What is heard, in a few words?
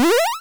power up